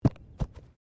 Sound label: Bodymovement Fall Light